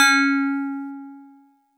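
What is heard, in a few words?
StarPiano DX100 C#0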